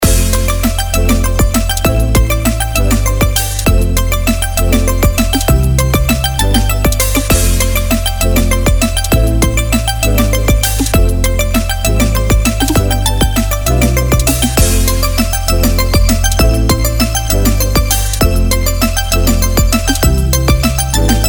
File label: Aqua Drops